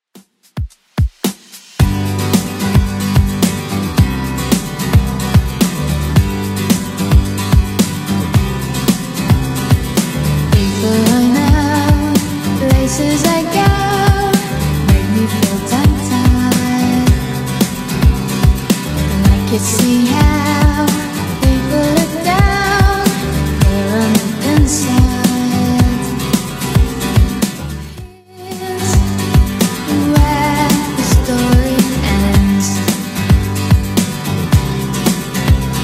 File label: 90s 110 bpm; Redrum